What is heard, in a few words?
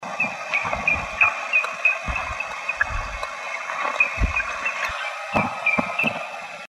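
grey-headed woodpecker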